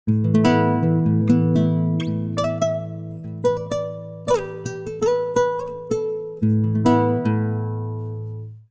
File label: Guitar, Time-Stretching